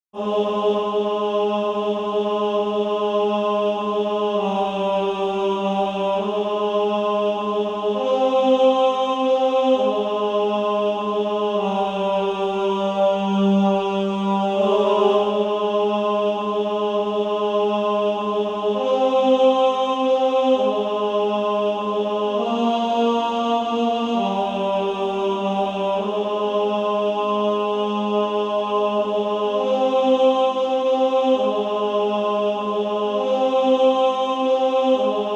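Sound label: Female Choir, alto 2; Ninety and Nine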